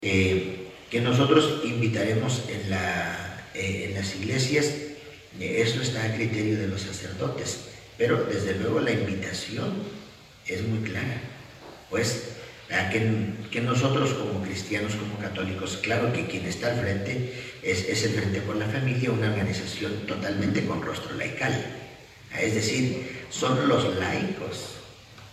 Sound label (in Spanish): marcha iglesia